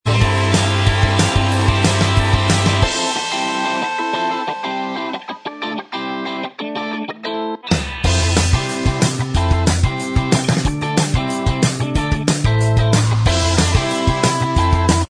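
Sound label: instrumental, backing track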